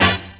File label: HORNHIT; E-Lab; X Static Goldmine 2; akai